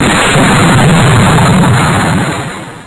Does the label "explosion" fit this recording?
Yes